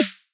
snappy liquid drum bass snare one shot

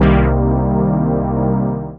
cinematic synthetic brass stab in cm

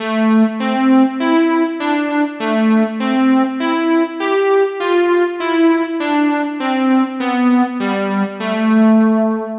80s synth lead melody am 100bpm

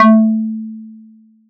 fm pluck

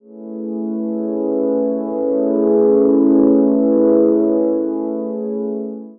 smooth ambient pad one shot; 6 seconds

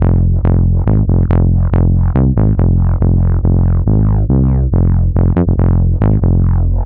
deep reese bass loop 140bpm 4 bars in fm

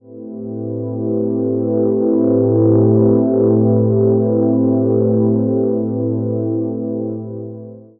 ethereal ambient pad in am

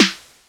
dusty boom bap snare; 05s duration

